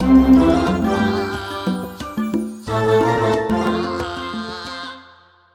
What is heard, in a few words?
Jungle Jabber (Coral Organ Ver.); mario rabbids kingdom battle complete video game soundtrack